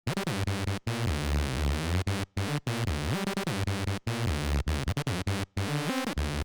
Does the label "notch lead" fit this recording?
Yes